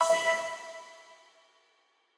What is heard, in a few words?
Quasar Clear B; Mixolumia